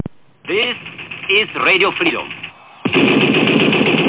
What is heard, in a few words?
radio